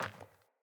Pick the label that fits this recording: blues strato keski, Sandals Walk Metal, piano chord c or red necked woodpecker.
Sandals Walk Metal